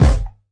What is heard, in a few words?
floppy bounce kick